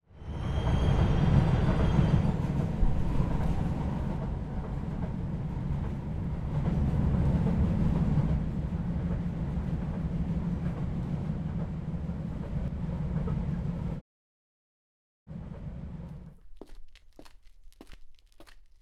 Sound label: Sound FX